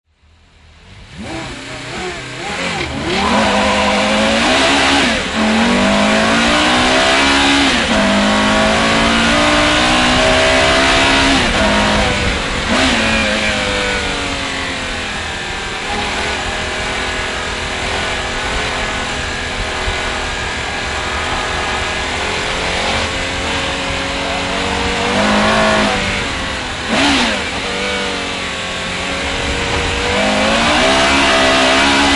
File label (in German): carrera gt fahrszene, Porsche, Démarrage / départ; A bord; (2003)